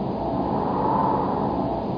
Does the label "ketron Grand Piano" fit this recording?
No